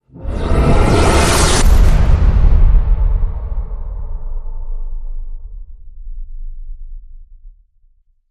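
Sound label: Hit Horror Warp Speed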